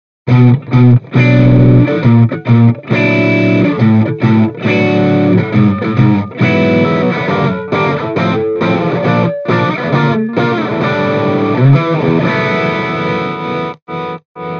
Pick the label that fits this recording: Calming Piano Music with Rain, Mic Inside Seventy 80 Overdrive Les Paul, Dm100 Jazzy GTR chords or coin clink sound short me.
Mic Inside Seventy 80 Overdrive Les Paul